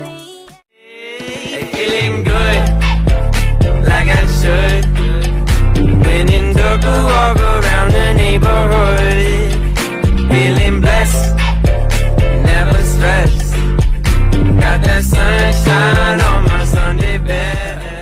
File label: English Ringtone